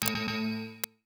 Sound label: Stop, Windows 7